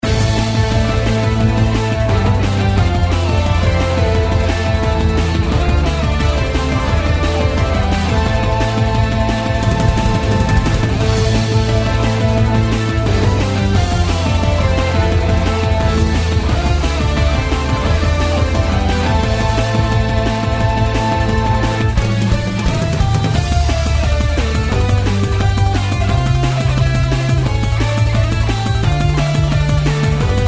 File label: Fight Theme loop; Mega Fighting Bots